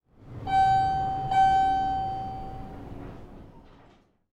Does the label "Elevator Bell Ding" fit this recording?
Yes